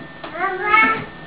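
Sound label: "mama", Japanese, baby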